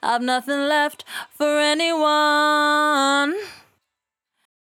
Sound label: 68bpm D, Velvet Vocal; Zero-G